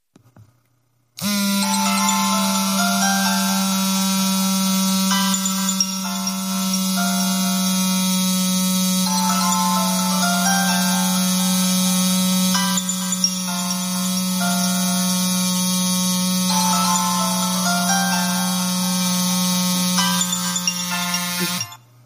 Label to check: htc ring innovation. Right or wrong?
Right